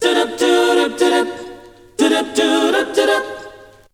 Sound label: VOC